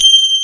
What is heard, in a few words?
DPF G6, electric piano; ELECTRIC PF#1